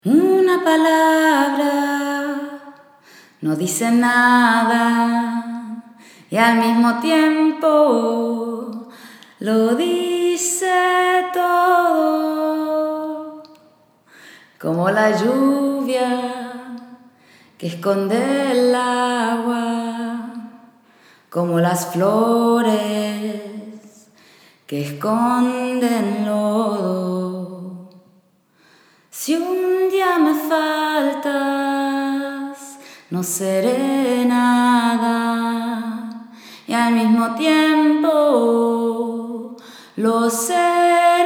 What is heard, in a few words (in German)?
Spanisch Gesang